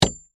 Hammer Hit Nail, Industry, Hammer, Hit, Nail, Heavy, Spike; Hammers; Tools; BlastWave